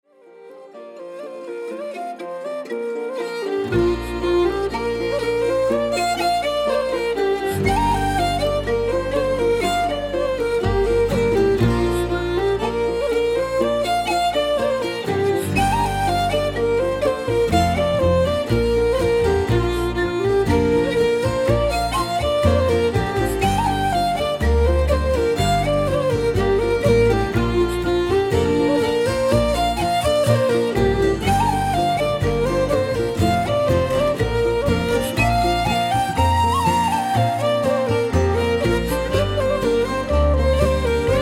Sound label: kerry fling; moll dubh a ghleanna